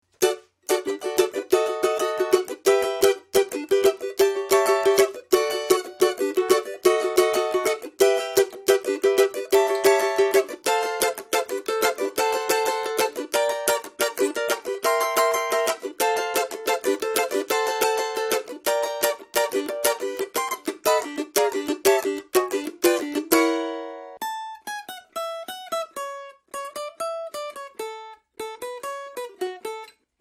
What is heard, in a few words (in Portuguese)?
cavaco